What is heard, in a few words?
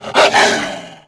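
Wolf Attack Vox; Civ4 beyond sword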